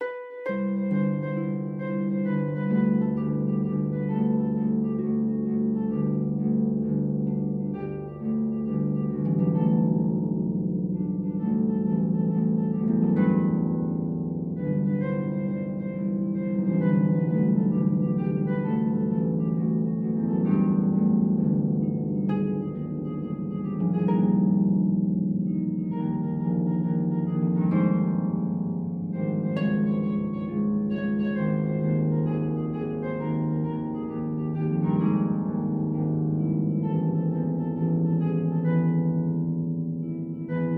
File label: if youre happy and you know it clap your hands harp